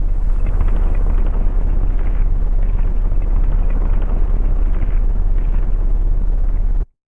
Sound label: Totemclear Drag